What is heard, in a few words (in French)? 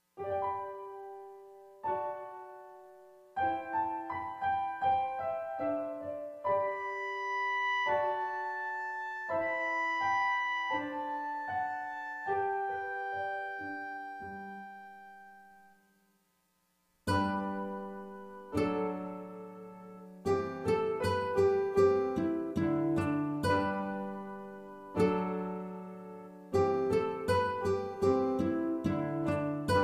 Instrumentale